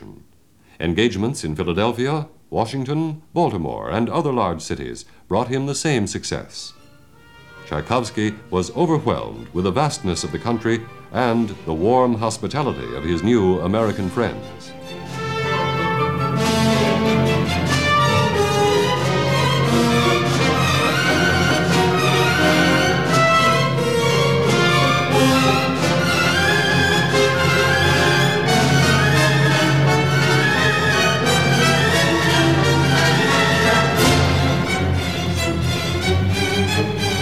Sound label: Marche slave in Bb Minor; tchaikovsky